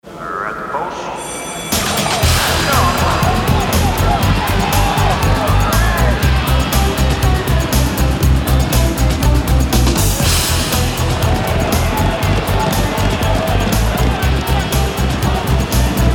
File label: Jingle; River Downs